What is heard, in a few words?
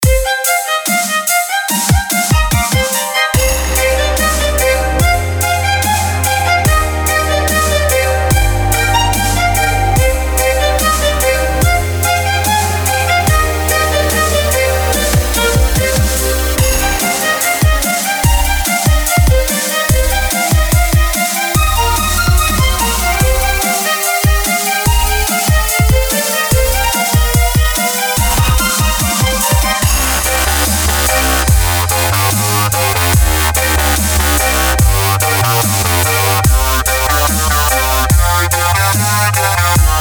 bgm